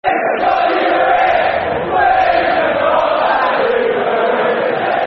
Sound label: Football Chants (Soccer Songs); We Forgot That You Were Here; Reading Rfc